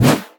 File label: State Teleported